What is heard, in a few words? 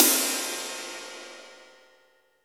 RIDE, Ride Cymbal